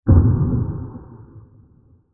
Diffuse Far Away Explosion; Explosions

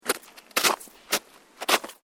SNOW SCRAPE; Material components for constructing more complex sounds; Sound Effects:Weather

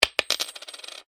money coin drop, Coin Drops Onto Table; Coin ( Single ) Drops Onto Wooden Table; foley; Objects; McKinney Sound

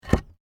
PAVING STONE MOVE, dragging; Material components for constructing more complex sounds; Dragging (Sound Effects:Objects)

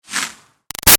Arrow By Ruffles Fast; Bow And Arrow Sound Effects; Bows and Arrows; Combat; Big Room Sound

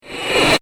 Heavy Metallic Swish Stops Abruptly; Whoosh Sound Effects; Andrew Fleming

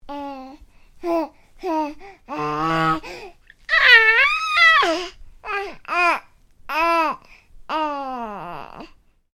Baby: making vocal noises, happy sounding; Babies (Sound Effects:Voice; Stuart Duffield

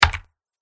Big Key Press, click

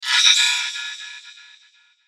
Whoosh High Pitch Musical Delay Reverb; Blastwave; Whoosh Sound Effects

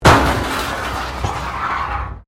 METAL BIN SCRAPE CONCRETE

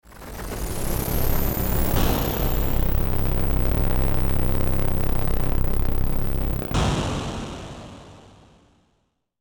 static electric; Static Electrical Buzz Hit

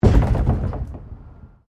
PLASTIC BARRICADE MOVE; Material components for constructing more complex sounds

